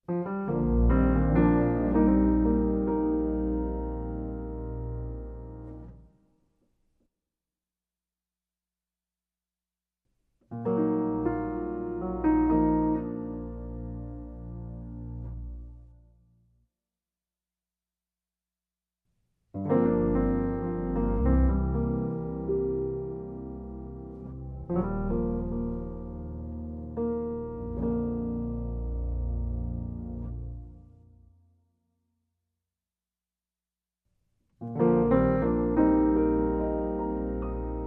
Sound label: Underscore With Synth Pad